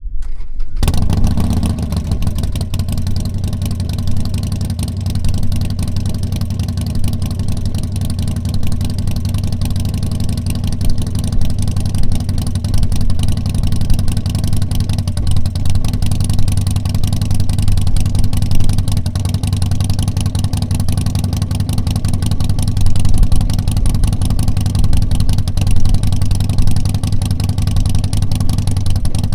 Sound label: Sportster 1200 3 Slip-Ons Idle; Exhaust Sound - Rinehart Idle; Harley